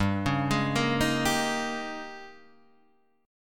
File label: G sharp Major 7th Suspended 2nd Suspended 4th